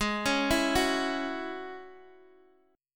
G sharp 7th Suspended 4th Sharp 5th, Guitar, DADGAD; down; chord shape